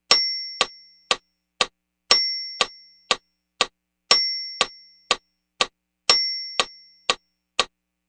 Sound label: metro